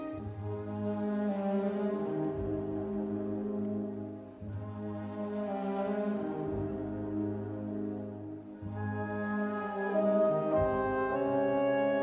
Horn Concerto; R Strauss

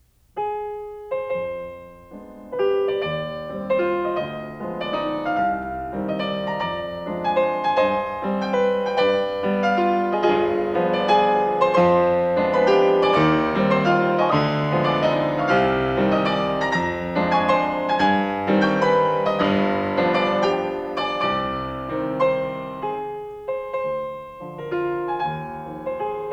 Carnaval for piano; Schumann; Op9

